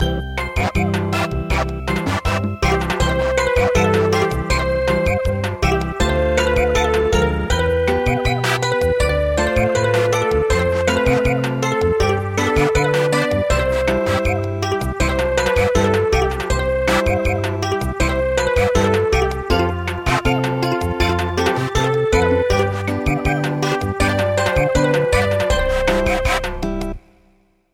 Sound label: Samba; The wooden clogs